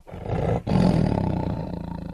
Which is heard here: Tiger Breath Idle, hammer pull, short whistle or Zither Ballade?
Tiger Breath Idle